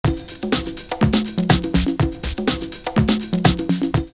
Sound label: Disco 123, drumloops Techno House; Tricky Disco; X-Static Goldmine